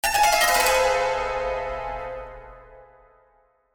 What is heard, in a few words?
arabian